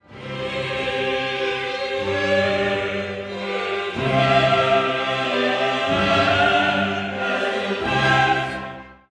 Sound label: Kyrie chorus; K337 Kyrie